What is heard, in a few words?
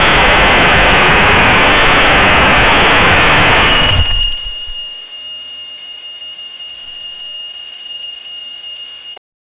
a compressed wifi energy, energy burst